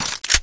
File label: luger clip in